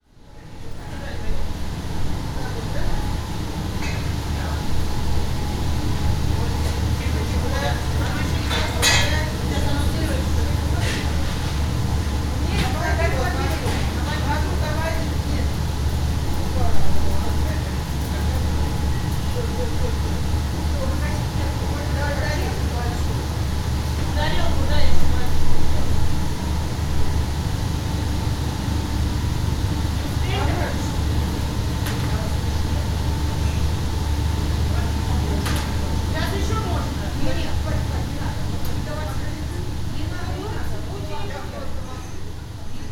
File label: college kitchen, sergiyev posad